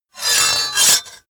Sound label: sword cut hard deep